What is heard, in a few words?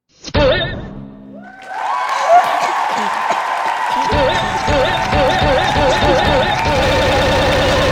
round of applause